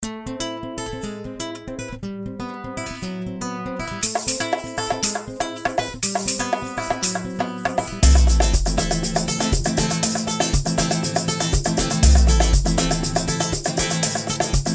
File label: spanish solo; classical